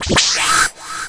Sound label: bonus; TetRize